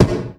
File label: boom